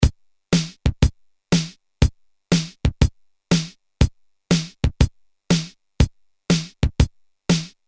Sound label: Shuffle, Boss DR-220A Preset Patterns